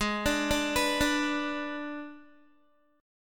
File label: G sharp Diminished G sharp, Guitar; G#dim arpeggiated; JGuitar